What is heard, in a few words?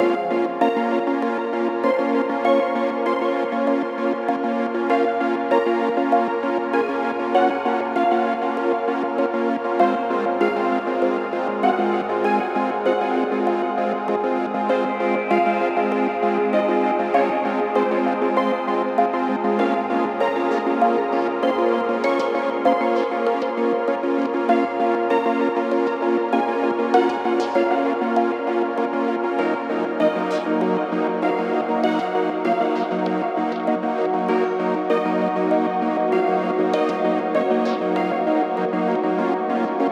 Defeat; project aether first contact